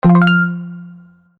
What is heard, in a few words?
positive feedback